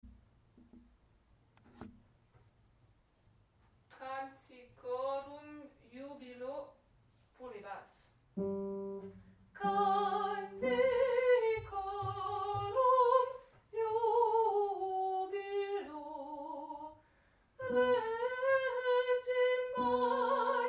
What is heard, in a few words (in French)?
canticorum jubilo basse